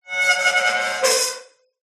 industrial gate farm metal swing squeak

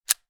weapon gun walther ppq; still north media

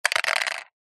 soda can empty crushed drop

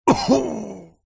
human male cough causes pain; zapsplat

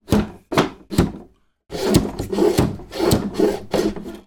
toy table football bar slide multiple

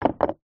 foley brick piece small set down on wood

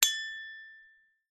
impacts metal hit chime scraper

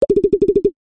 cartoon bubble pop multiple fast

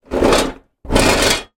household lawnmower move drag sideways; zapsplat

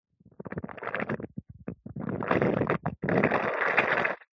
cartoon watery squelch poop diarrhea; zapsplat

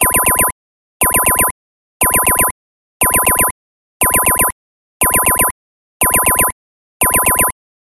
science fiction alarm loop

